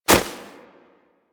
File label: Walk Snow, Footsteps; Warframe; Operator